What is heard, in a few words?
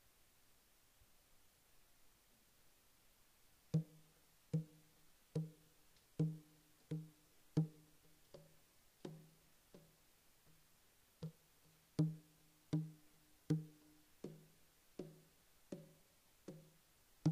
Timbales